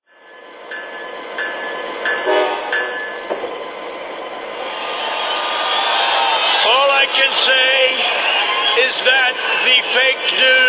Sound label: es44, trains